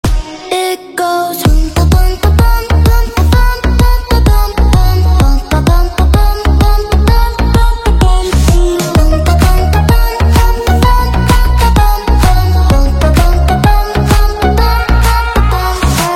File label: the drum remix